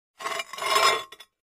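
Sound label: Large Tile Square Scrape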